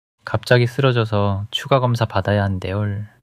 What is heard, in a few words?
crying